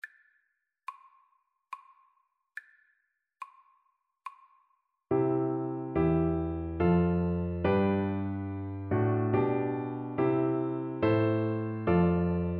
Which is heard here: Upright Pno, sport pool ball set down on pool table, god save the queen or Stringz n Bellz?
god save the queen